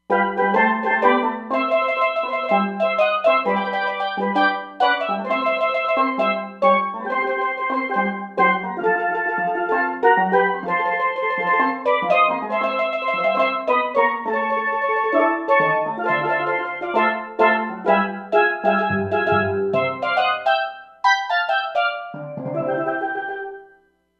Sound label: Steel Drums, Roland Fantom XR